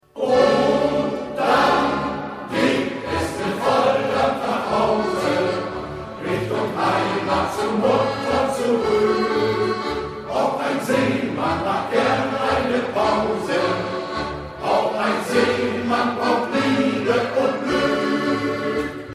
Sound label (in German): mit volldampf nach hause